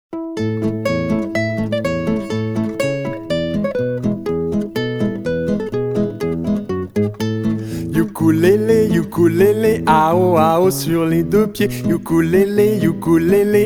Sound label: Ukulele